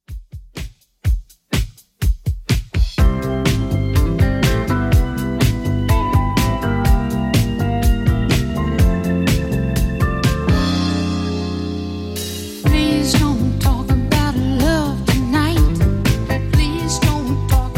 70s Redrum 124bpm

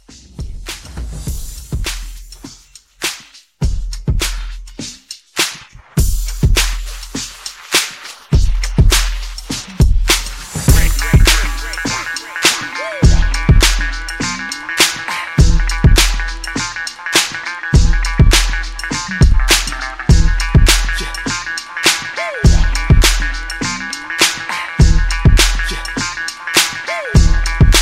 Mixshow

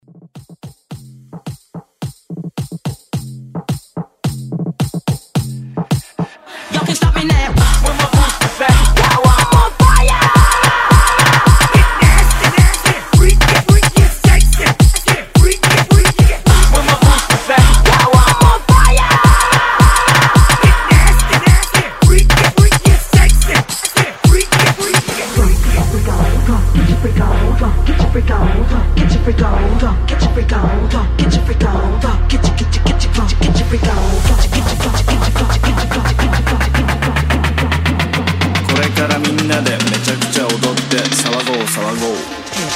Moombahton 108

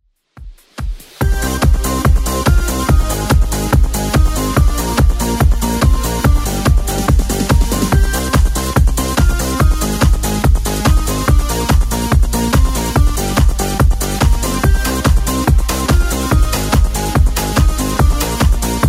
90s Redrum 143bpm

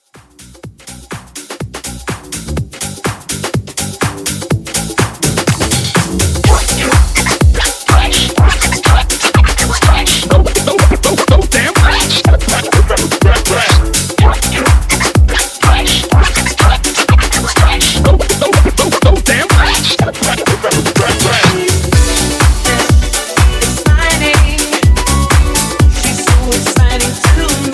House Mixshow

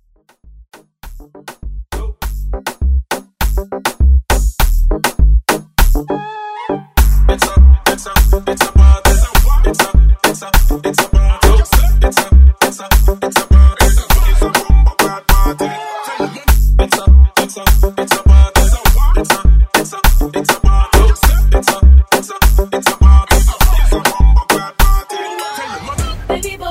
Moombah

